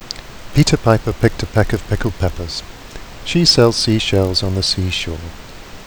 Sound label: filtered